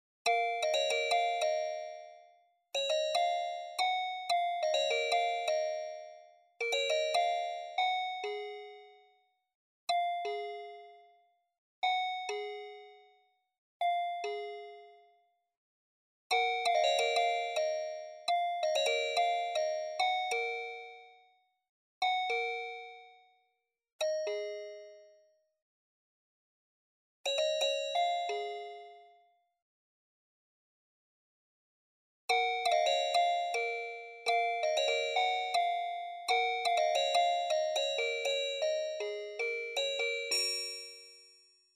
toy piano blip

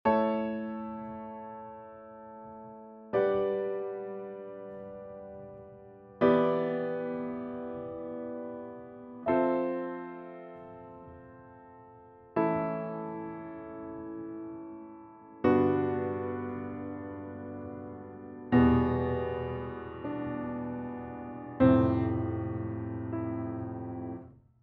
78bpm Piano Samples

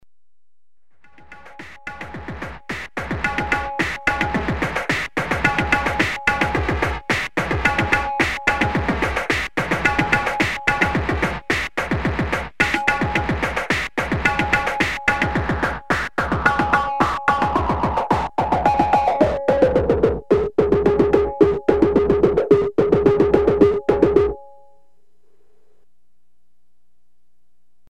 Beats

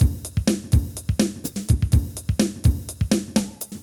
Drum Kit Modmid Loop 2 bar 125 bpm; Mixter Plus Drum Kit

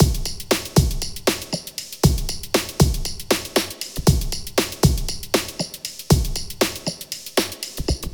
Drumloops; MixterPlus

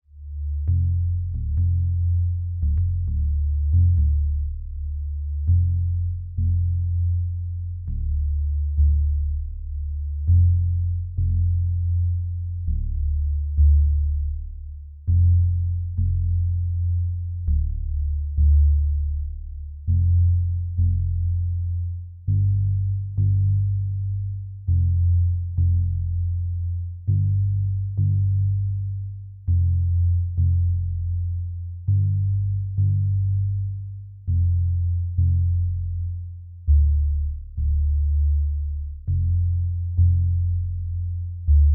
bass

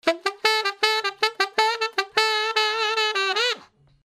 brass loop

